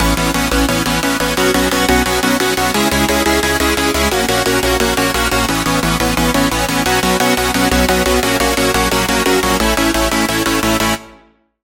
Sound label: Chord; Routed Sound; Sylenth1 Expansion - Flame Summoner Vol.1